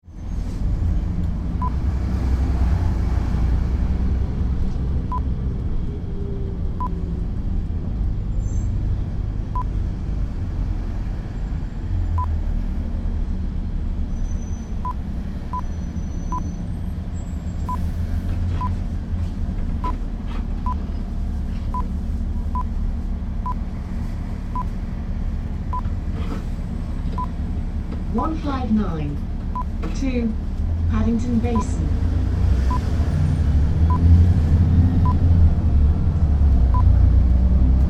London Bus; 159

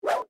Short Whoosh

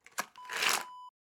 candy box pour

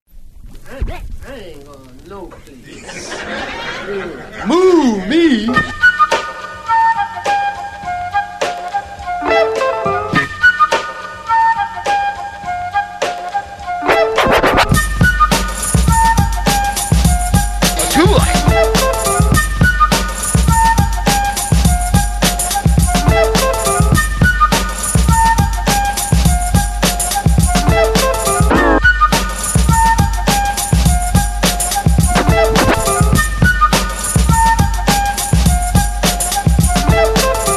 flutes sf2

